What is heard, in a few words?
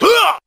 "huh"